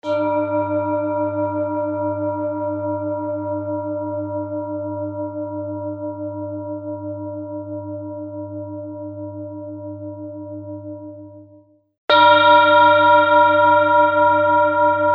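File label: tibetan bowl uranussaturn, G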